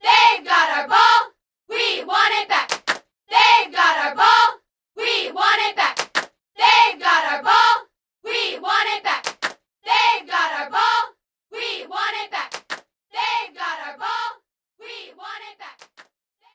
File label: Sfx Basketball